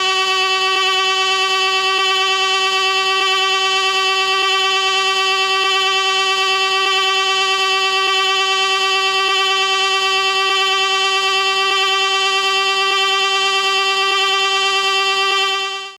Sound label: a#2, Cello, ORCHESTRON